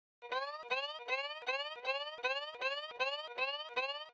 Ship Break In Alarm; lethal company

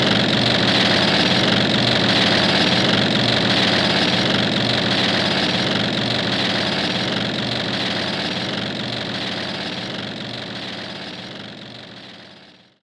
Enemy Road mechanic Drill

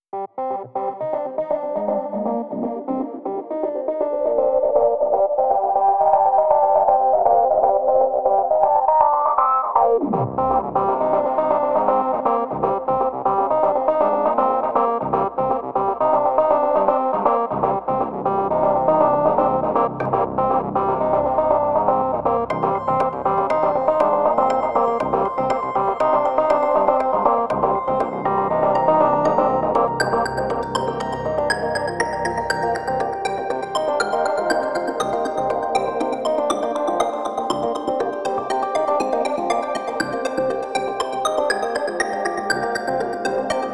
Synths 120; Dew Point

